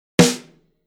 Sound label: Snare